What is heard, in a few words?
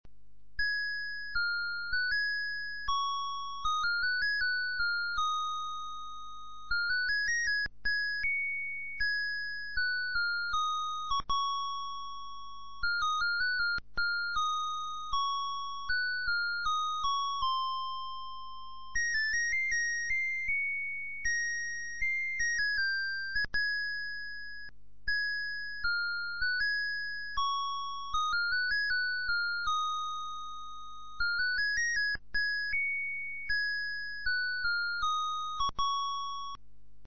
SEIKO EPSON 7920 Melody IC; 見よ勇者は帰る - 7920Q 演奏曲